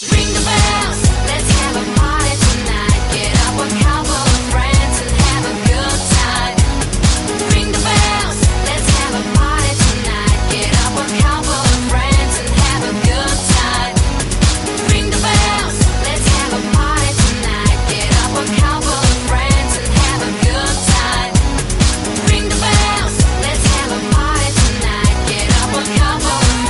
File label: ringing of the bells